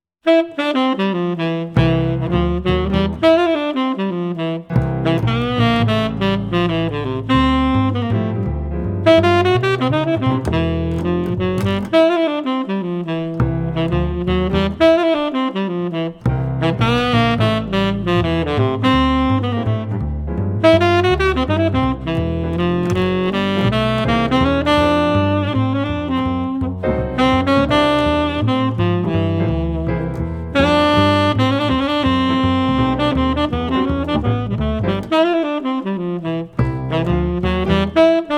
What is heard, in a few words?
ensemble, jazz ensembles